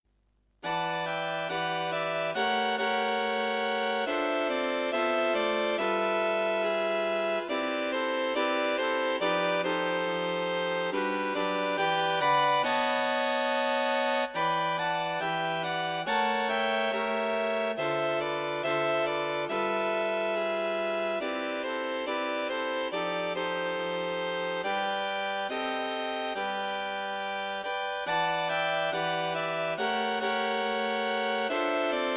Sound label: A recital piece for String quintet.